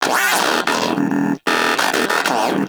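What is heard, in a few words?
Moa Aggressive Vocal Interact Sit Forward; Warframe; Pets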